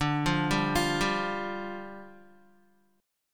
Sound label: D Minor Double Flat 5th, down, Guitar